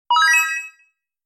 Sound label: Item Found